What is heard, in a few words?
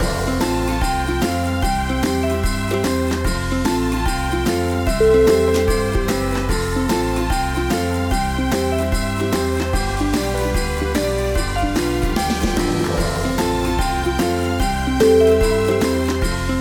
ukulele on christmas